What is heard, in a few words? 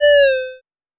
Player Cant Boost